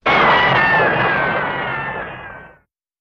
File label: Ricochet, bullet bys & riccos